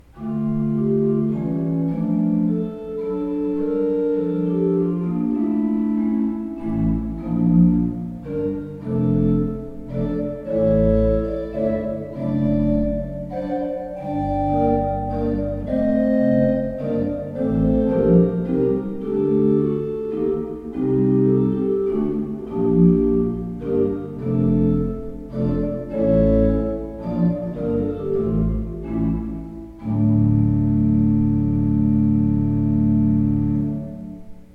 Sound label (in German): Stahlhuth, gebrauchtorgel